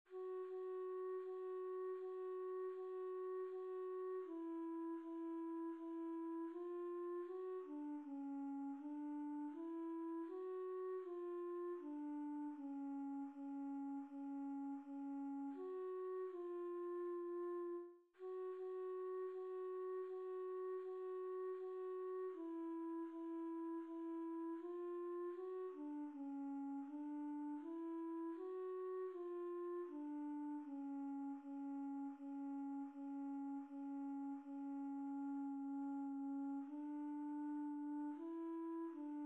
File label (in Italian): Fermarono i cieli Alto, coro